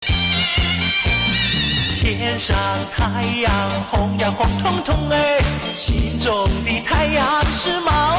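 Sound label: chinese music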